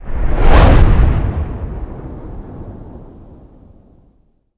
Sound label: blackhole blow